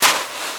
STEPS Sand Walk; UltimateFishing

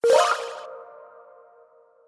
Reward; UltimateFishing2020

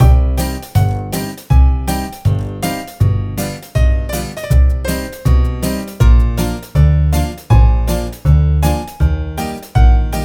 cafe shanson music loop; Fishing2

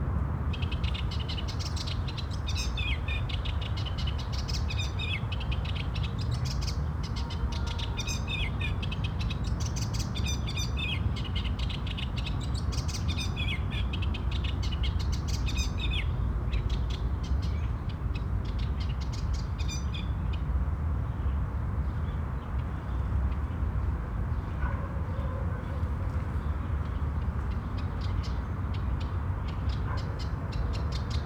City Park Ambience Loop; UltimateFishing2020

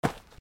Stone Footsteps; UltimateFishing2020

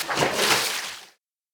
Big Water Splash; UltimateFishing2020

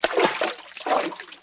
Water Splash; UltimateFishing2020

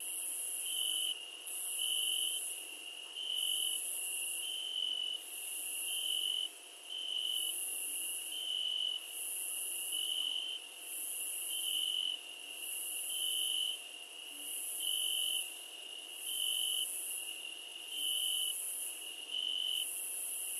Atmospheric Sounds Cicades; UltimateFishing

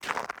Ice Footstep; UltimateFishing

